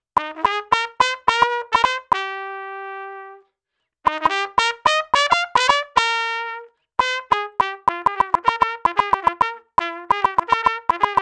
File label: trumpet processed; pertusa; generated sounds Leveau